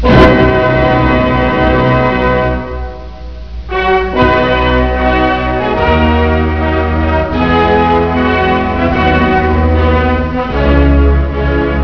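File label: Soviet anthem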